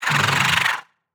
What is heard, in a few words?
crawler idle